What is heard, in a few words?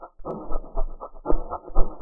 119 asthma percussion noise, drum loops